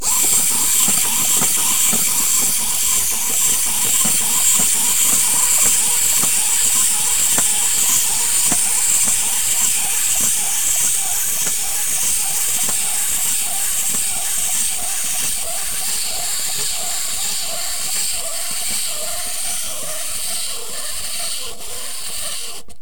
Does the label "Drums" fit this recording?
No